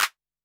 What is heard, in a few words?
Electro Kit Clap